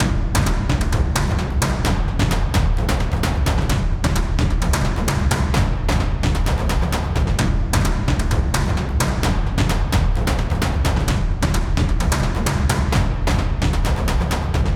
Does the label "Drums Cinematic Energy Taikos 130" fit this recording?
Yes